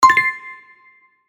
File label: mail